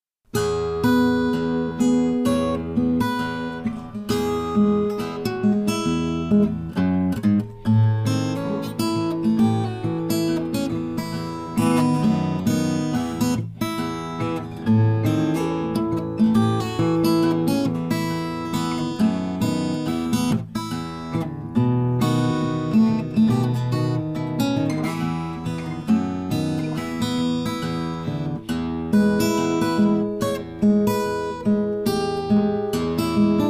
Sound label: E blues